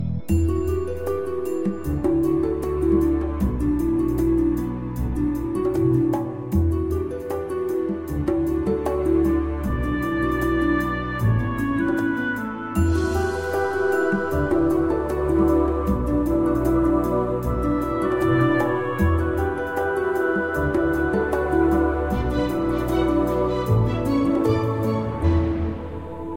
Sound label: ringtone